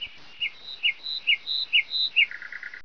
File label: bird lark, amb